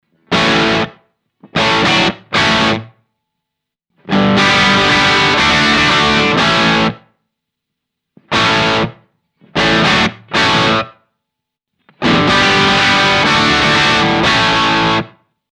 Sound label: Tokai LC-85 – Marshall-style